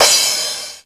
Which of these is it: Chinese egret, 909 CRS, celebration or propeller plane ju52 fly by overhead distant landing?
909 CRS